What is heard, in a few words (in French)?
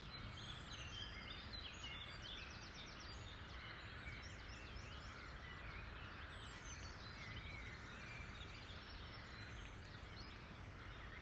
oiseaux matin; hastiere; a briqueterie de waulsort